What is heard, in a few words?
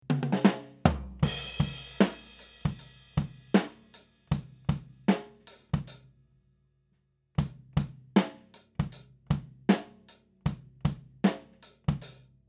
Section C Side